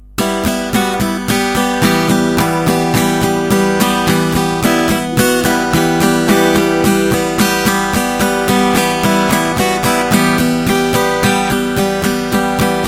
guitar; STALKER

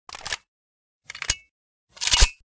aek971 reload; STALKER

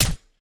silencer; walter; STALKER

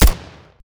g36c fire; STALKER

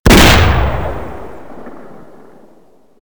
double shotgun fire; STALKER

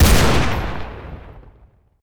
magnum fire; STALKER

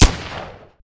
aek971 fire; STALKER

